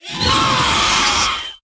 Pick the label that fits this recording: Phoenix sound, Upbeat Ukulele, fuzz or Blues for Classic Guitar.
Phoenix sound